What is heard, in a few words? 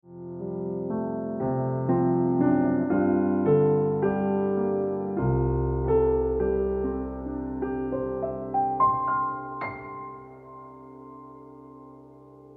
Piano Music